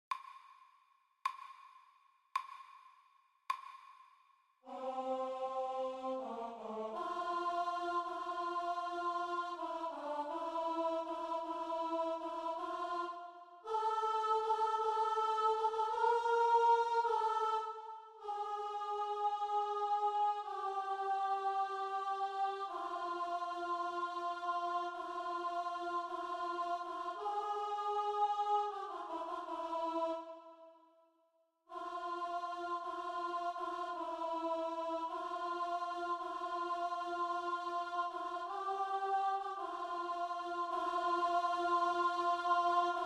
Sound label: alto; Mozart; Laudate